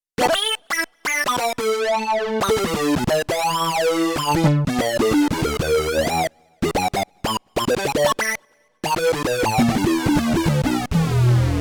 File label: VeloSyncLead, Korg Radias-R